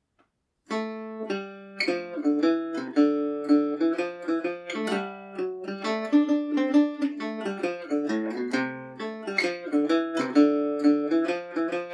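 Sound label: D dorian, Irish mandolin; peacocks feather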